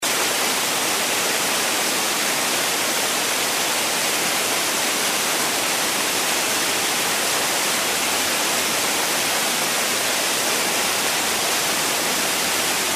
fontan shumit

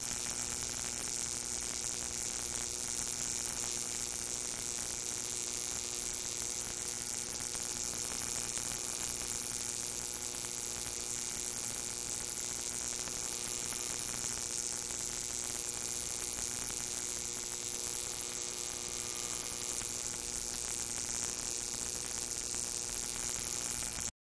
zvuk svetiashegosya logo

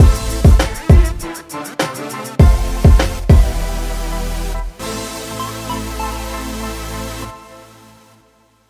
otbivka dlya video

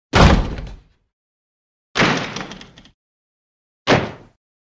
hlopki dveri

